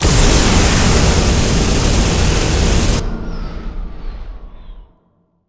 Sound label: explode